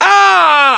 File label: pain; terror